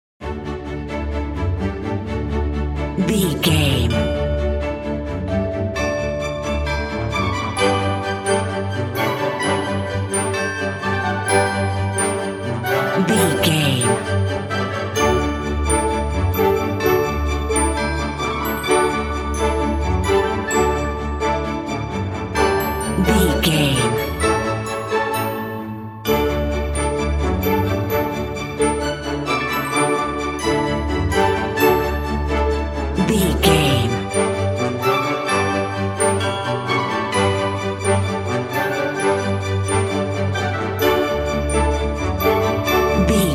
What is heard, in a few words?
joyous occasion narrative